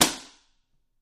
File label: Book Slam Slap Good